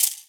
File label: EQ Perc, Percussion Mix